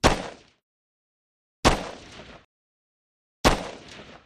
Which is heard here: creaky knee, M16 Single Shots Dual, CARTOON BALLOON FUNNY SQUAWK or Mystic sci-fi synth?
M16 Single Shots Dual